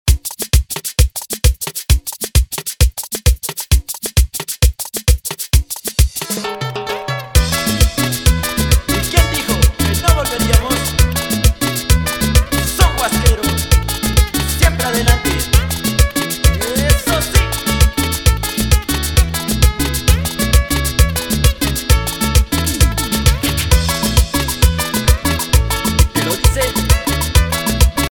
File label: remix intro 99 BPM